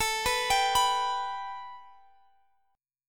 A 7th Suspended 2nd; A7sus2; arpeggiated

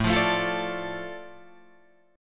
A Suspended 4th Sharp 5th, Guitar Drop D

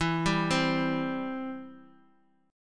E-Minor Sharp 5th; x,4,3,3

